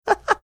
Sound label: short laugh